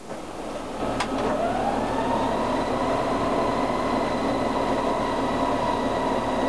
comp start, CLASS 47, Train; OpenBVE

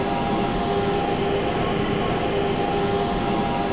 Motor, Train; KCSV7 csatolt

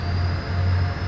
Motor, Train; OpenBVE; Expreso CIWL Fleche DOr

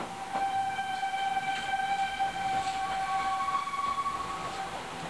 flange, Train; OpenBVE

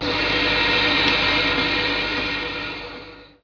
air zero; OpenBVE; Bzmot

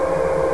MOTOR, Train, R110B; OpenBVE

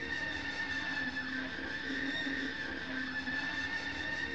rub, train, class 66; OpenBVE

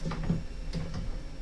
Wet Wipe, Train; OpenBVE

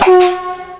klaxon, Train; OpenBVE; Expreso CIWL Fleche DOr

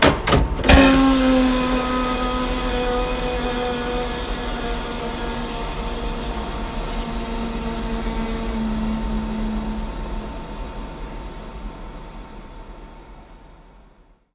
rev fw, Train, R62 Kawasaki; OpenBVE

